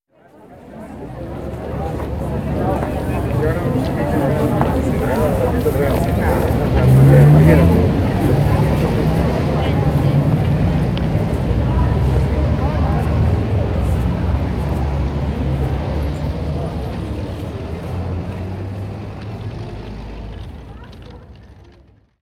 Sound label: Crowd Surge; The 39 Steps